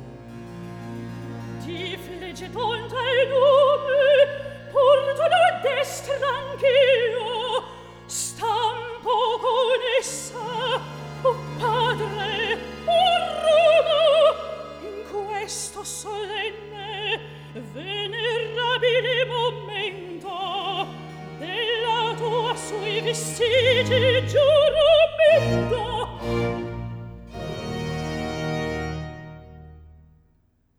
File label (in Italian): Recitativo Accompagnato; Atto 1 - Scena 1; Manlio; Di Flegetone al nume porto la destra; Antonio Vivaldi (1678 - 1741); Vivaldi - Tito Manlio (2005)